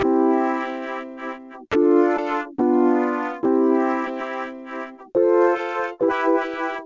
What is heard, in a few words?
fill; Pong; music; ice